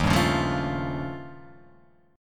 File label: D sharp 9th Flat 5th D sharp, Guitar Open F; D#9b5 strummed; JGuitar